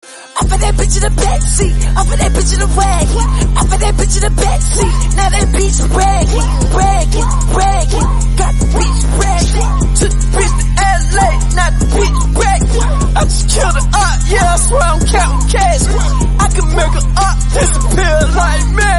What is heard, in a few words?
english song ringtone